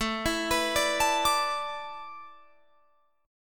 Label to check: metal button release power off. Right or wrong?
Wrong